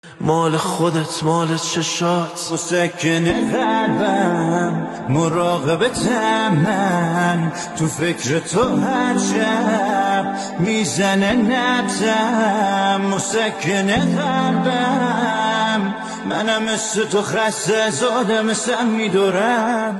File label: Remix